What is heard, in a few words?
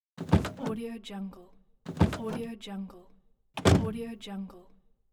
car door open and close